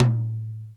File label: Roland U110 Drums A1; drum machine